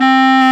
WND HARD, Clarinets, Clar Bryt; Roland LCDP04 Orchestral Winds; 90 s Sample CDs

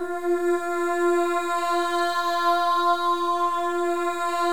MOVING V2, Symphony of Voices; Spectrasonics

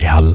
"YAAAHO", VOCAL

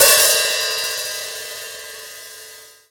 Let It Ring, Hats Samples; Drum Hits; Nu Dance Electro

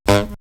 Reed; AMG; Brass n Wind

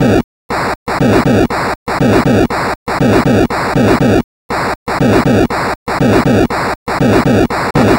Atari 2600 Noise Drums

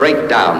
VOCALS, House

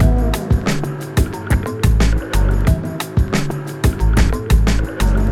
jazz, breaks breakcore

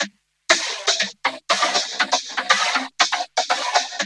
VOC LOOP, VOCODER LOOP; Zero-G Ambient 1

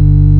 ORGAN G1; Big Fish Audio; Synth City

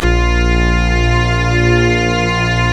ORGAN, A#; Big Fish Audio; Synclav FM

